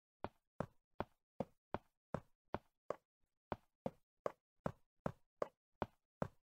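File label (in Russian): shagi po jelezu, footsteps on iron; minecraft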